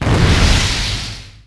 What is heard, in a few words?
fires; RYL